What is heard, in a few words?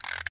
Spike Rotate In; Cut the Rope Holiday Gift; android ios mobile gamerip